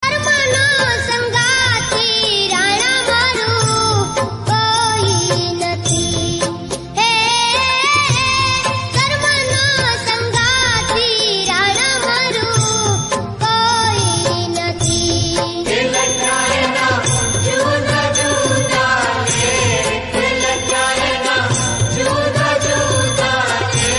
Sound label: gujarati bhakti song